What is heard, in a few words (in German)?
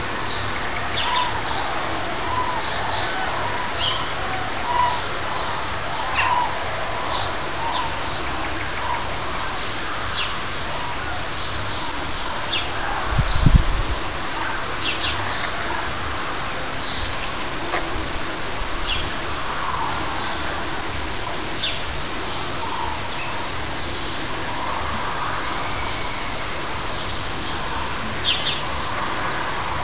kraniche formationsflug